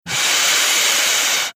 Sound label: party horn bad